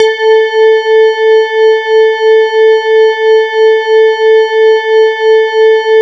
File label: E-HARP, DX HARP; Wizoo - Powered DX